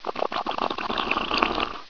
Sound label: GURGLE; homm2